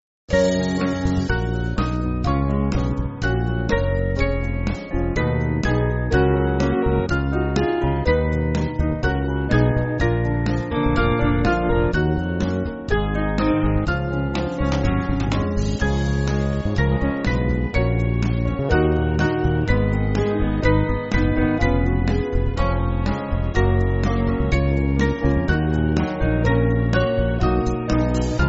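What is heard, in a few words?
Band, Music Backing; 5/Gb-G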